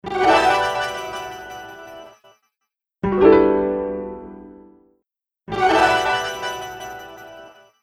Harmonizer Piano Dual; H949 Harmonizer; Preset: Flurries (Dual)

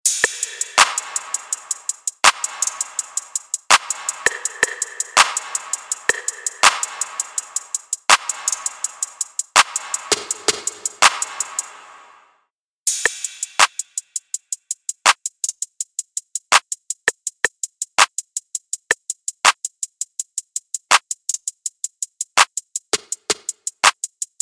Drums, Snare Drum; Tverb; Preset: Token Snare Verb

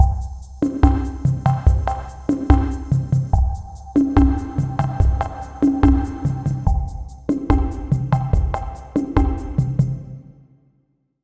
Bossa Loop; 3: Change the Room (Automated); Temperance Lite

